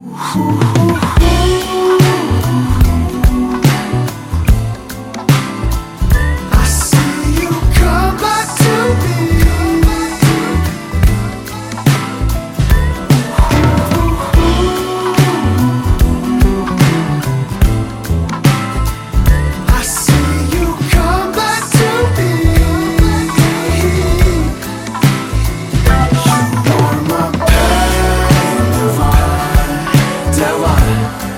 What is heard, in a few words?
Ooh Ooh Ooh Ooh Ooh Ringtone